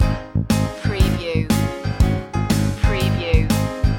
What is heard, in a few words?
sunny day loop